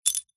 industrial bolts metal few small set down

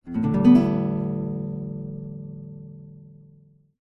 guitar nylon out of tune single strum

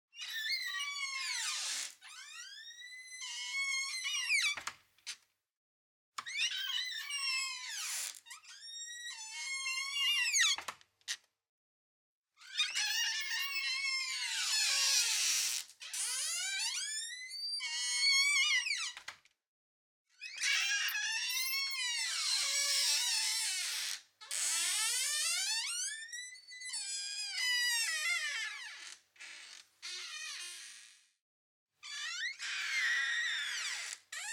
Squeak Bathroom Door Small; noise creations

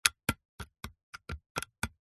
bird claws footsteps on concrete; animals

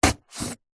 cardboard box knife fast stab into; zapsplat

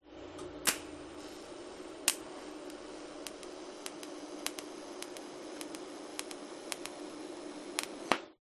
industrial tig welder weld spark

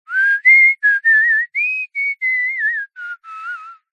human male whistle short tune riff

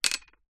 foley bottle top metal down on wood surface

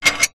household gate bolt movement

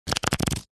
playing cards flick through shuffle; zapsplat

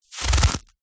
foley footstep single boot wet slushy snow; zapsplat

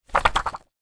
foley notepad shake wobble

